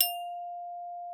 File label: F5, vibes; Xsample VOL-4